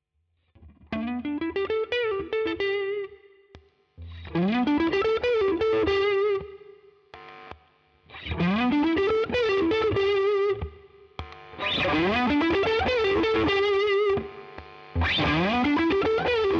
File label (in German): Strat 12 Uhr Gain 12 Uhr Tone GLASS